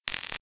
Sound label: Elec Short; Playable Tutorial Level